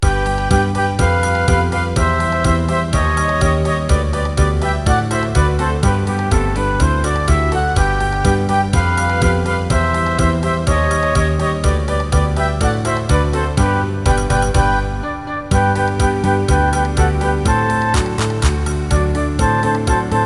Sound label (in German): zirkuslieder playback